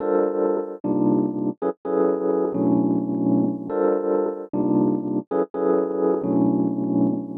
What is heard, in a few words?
Epian Loop 130; Equipped Music; Loungin House